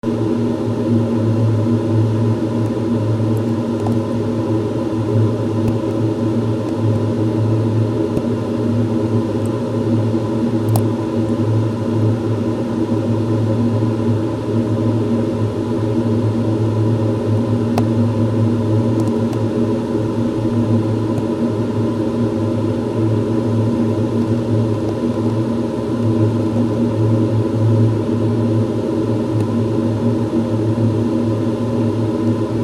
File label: air vent